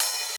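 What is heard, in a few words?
open hi-hat; eddyflux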